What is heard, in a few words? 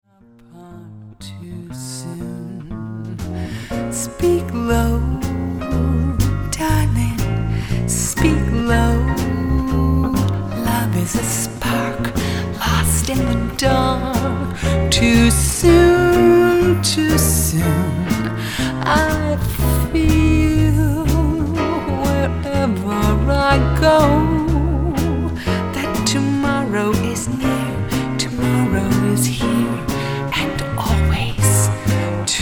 Ab quartet